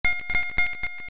Rupee Bounce; A rupee (or Kinstone or Mysterious Shell) bouncing

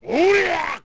Ganondorf Throw

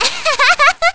Girl Laugh; Girls laughing; Zelda

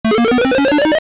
Beanstalk Small; A small beanstalk growing (Super Mario Bros. sound)

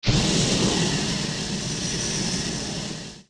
Fire Jet Long

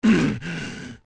Ganondorf Hurt

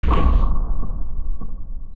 Drawbridge Down Done

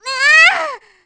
Tetra Struggle; struggling in the tree; Zelda

